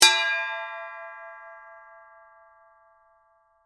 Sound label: Bell Ring Nautical, Transportation, Watercraft, Bell, Ring, Nautical; Boats